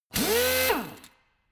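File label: impactor soft long, drill; maschinen; bohrer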